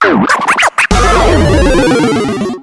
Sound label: Racetrack Lap Losing; Trailblazers; macOS; PS4; Windows; Xbox One